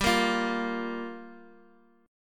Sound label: G Minor 7th, Guitar, DADGAD